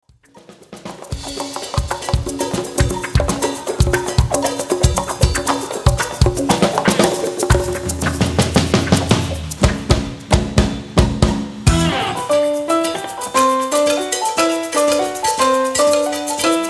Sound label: conga live